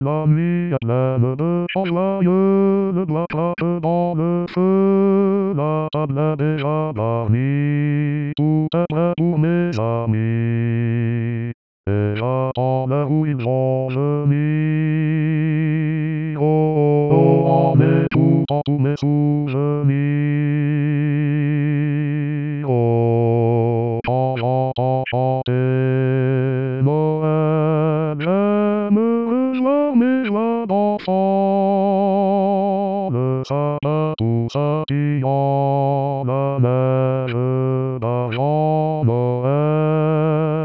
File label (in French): Baryton